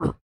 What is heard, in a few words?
Hurt; Joblin